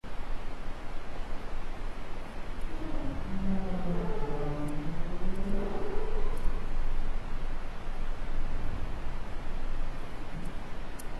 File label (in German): Buckelwal; helmholtz200; Challenge200